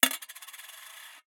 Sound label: falling coins rotation; CB Sound Design; Essential Sounds Vol.01 Coins